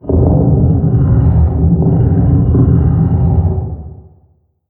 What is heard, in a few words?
Random Rock